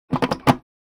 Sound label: Scooter storage compartment